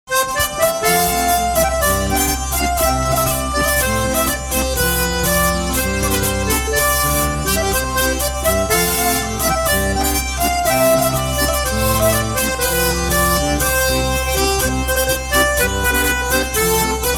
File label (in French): polk; Musique Du Morvan